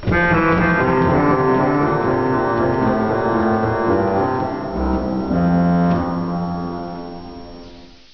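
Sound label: Posaune 8, organ; Martin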